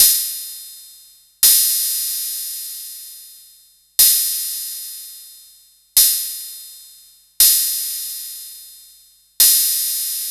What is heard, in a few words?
cymbal, raw